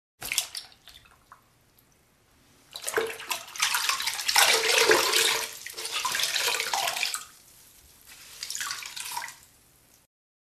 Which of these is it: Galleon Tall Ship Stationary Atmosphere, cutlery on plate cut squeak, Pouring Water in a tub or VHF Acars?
Pouring Water in a tub